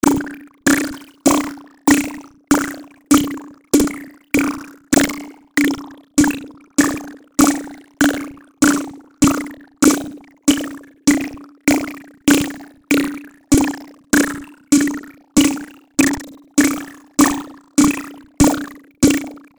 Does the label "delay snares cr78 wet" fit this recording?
Yes